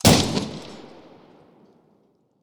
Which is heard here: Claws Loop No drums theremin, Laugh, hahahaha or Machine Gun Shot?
Machine Gun Shot